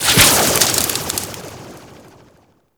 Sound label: Aura Fire Impact; AI Leaders